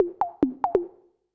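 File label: PERCUSSION ELECTRO 140, Techno Drum; Techno2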